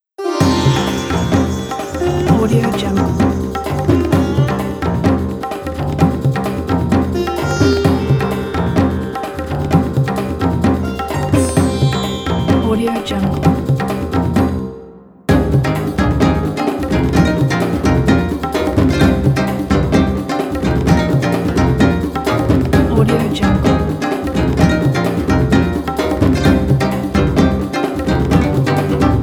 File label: bollywood